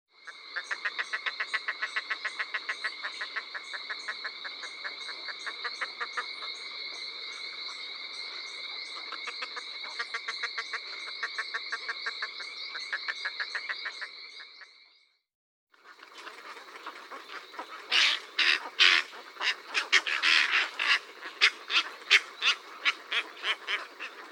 heron garde boeuf Cattle Egret, chants des oiseaux; Guadeloupe